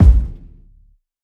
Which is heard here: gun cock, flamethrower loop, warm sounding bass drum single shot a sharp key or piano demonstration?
warm sounding bass drum single shot a sharp key